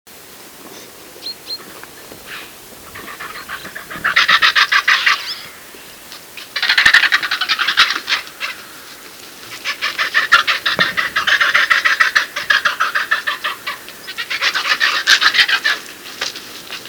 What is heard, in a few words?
Eurasian Griffon Vulture; Kizil Akbaba